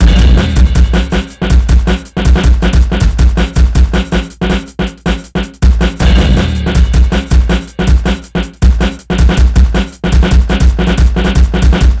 DRMNBS; INIS; Funky Xtreams

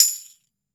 TAMBOURIN

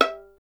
STR VLN, Solo Instruments; Miroslav Vitous

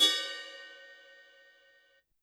Ride Cup Brush, YAMAHA RM1X

